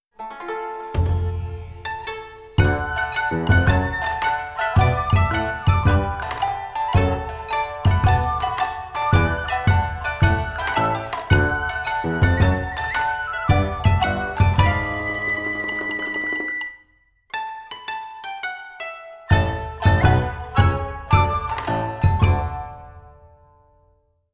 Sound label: Yummy Dango Song - Instrumental; Monster Hunter Rise Original Soundtrack